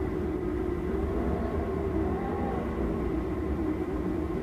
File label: wind